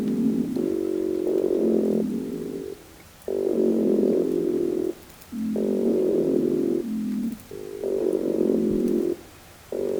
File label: Aplodinotus grunniens Drum Call Chorus